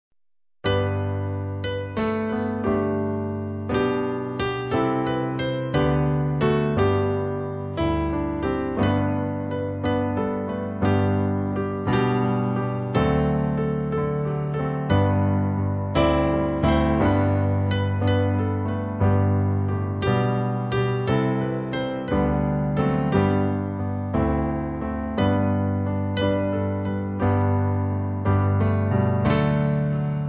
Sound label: O How I Love Jesus, Piano